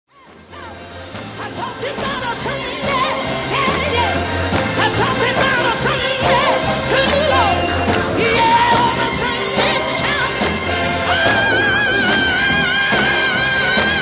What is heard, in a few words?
gospel